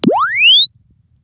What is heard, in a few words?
Press Two Sides Chirp; Samsung 4 Recordings